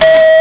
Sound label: ding